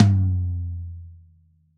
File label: Tom Rack Stick Center, Drums